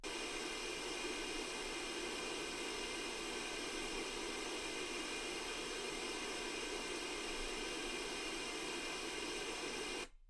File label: wgn